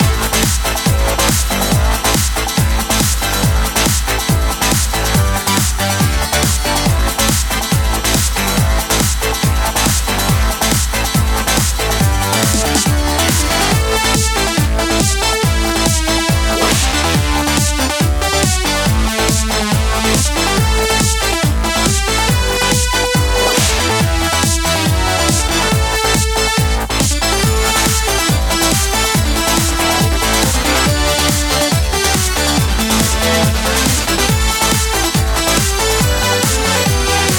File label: desert Loop